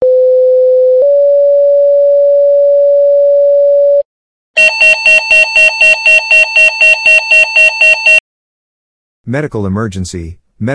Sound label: medical emergency